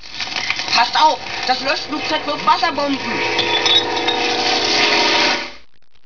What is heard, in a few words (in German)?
wasserbomben